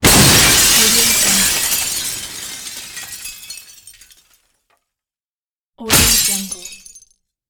glass smash

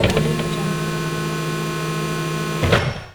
robotic mechanism

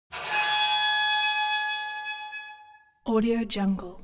object metal squeal saturated

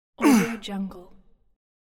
man soft pain

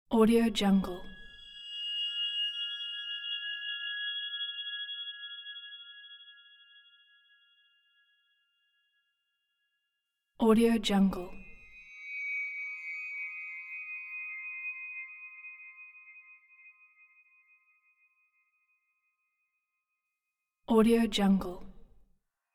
shining crystalsword effect

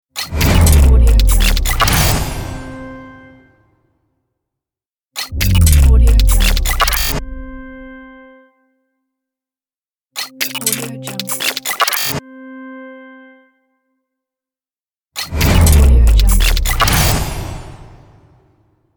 glitch logo

